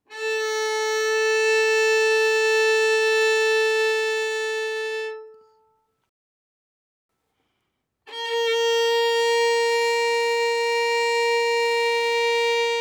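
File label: Violin arco fortissimo on A string A4 B4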